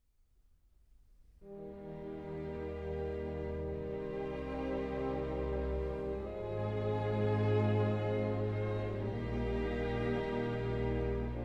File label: Piano Concerto No. 2 in B-Flat Major, Adagio; Beethoven; Op. 19 II.